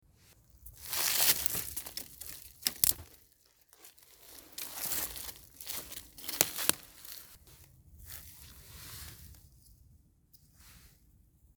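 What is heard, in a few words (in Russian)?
pushing branches aside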